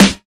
Hot Snare Drum G Key, acoustic snare sample tuned to the G note; snare drum samples